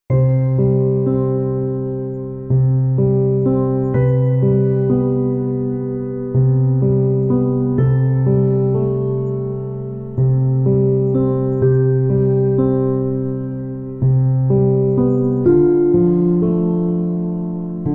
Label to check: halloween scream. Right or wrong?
Wrong